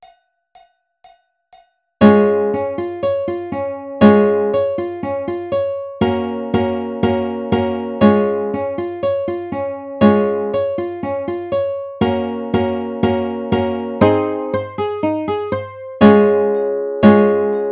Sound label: Db Major Arpeggiator Ukulele; Jumping Flea Arpeggiator